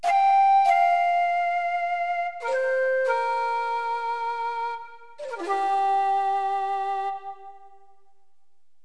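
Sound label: shakuhachi; Roland; An Invitation To The Roland World Of Music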